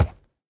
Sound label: wood, horse